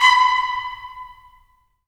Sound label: BRS, Tps Stacc; Roland - LA Composer vol. 4